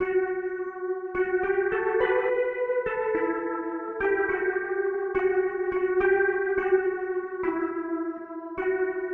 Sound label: Cmaj 105BPM Lead; 99Sounds; Monosounds; Music Loops; PANDORA BOX!